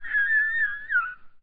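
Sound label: Special Photo Barcode Forest Environment Bird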